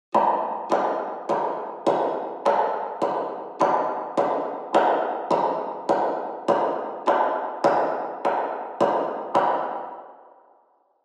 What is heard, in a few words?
Footsteps